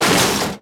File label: crush, Impact